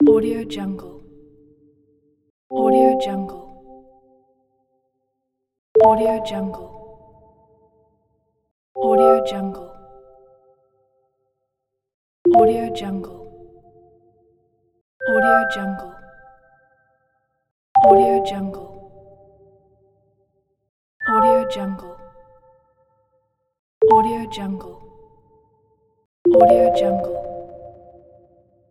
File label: interface sounds